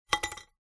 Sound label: household padlock small set down on concrete paving